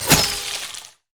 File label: ice shard; LoL; lissandra